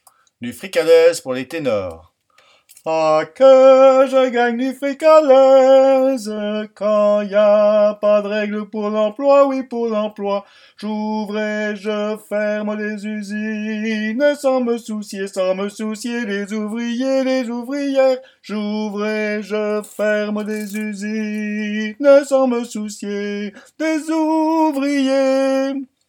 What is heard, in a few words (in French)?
fric a l aise tenor